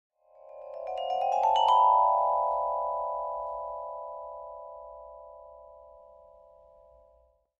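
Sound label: Vibraphone Ascending; mallets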